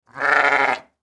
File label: Sheep Baa Short; Animal